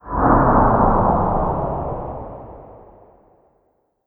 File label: meteor whoosh